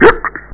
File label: bonus